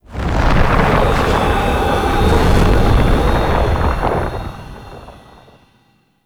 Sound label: Stinger Out; Warframe; Tau; Cinematics